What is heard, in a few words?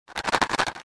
scuttle, mine